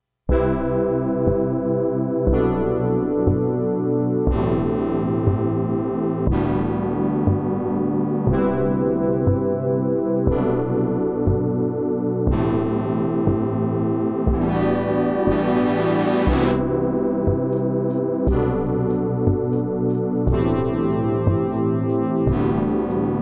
hardware loop; weeklybeats-2020

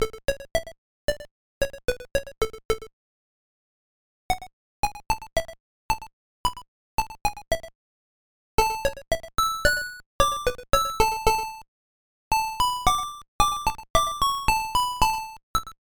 classical psg opll; weeklybeats 2024

